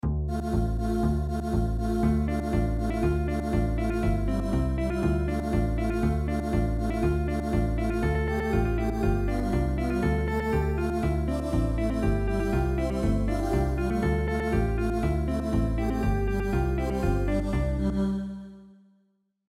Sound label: Polka